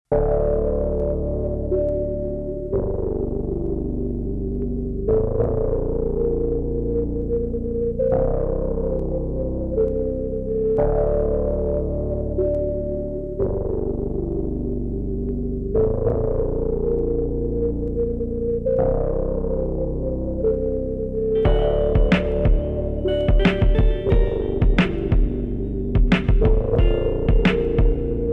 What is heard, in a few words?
OP1 TYPE BEAT